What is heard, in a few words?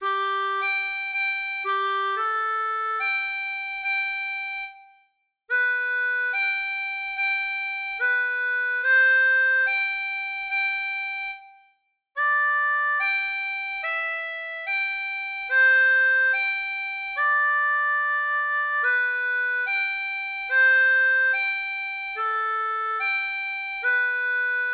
Progression, harmonica